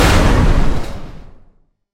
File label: explosion